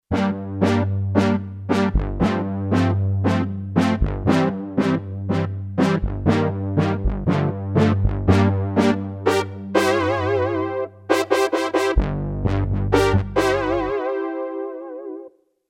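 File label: OBX Brass; Kurzweil K2500X; Factory Programs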